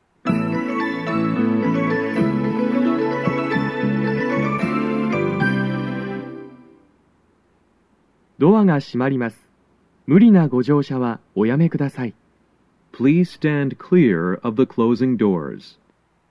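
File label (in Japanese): departure, 発車サイン音; 4番線 中野・三鷹方面; 収録者:こたけ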